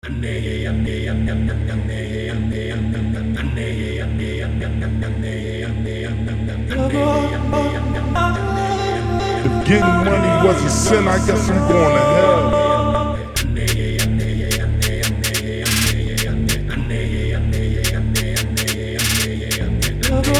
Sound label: Drill 144BPM Dm